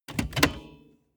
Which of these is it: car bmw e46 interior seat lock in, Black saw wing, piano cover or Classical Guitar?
car bmw e46 interior seat lock in